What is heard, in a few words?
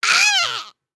Die; Lego Strombie; creatures organic